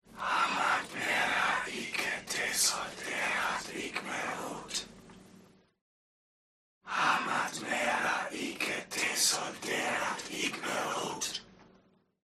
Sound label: HUMAN CROWD Evoke Whisper; smartsound